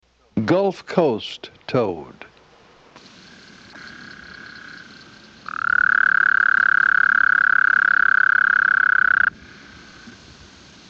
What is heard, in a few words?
Gulf coast toad (Bufo valliceps); Family Bufonidae - Toads